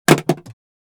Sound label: Plastic item drop onto hard surface; impacts; gfxsounds